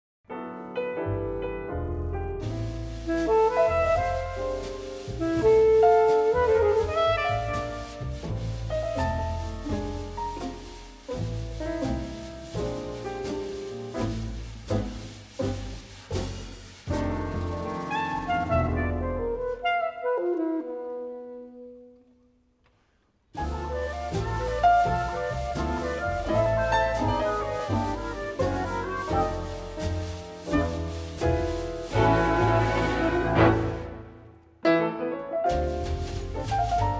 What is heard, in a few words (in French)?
orchestre; Be-bopéra; une enquête de John Chatterton